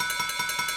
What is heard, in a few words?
big win count up loop; netent